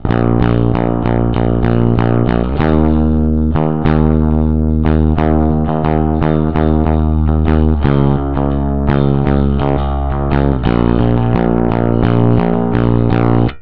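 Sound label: fuzz bass, Valve Guitar Preamp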